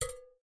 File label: Door Count; raw game audio files; Chibi; MiSide